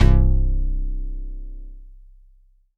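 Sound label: bell C3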